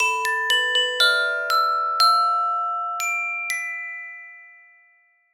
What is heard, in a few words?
music box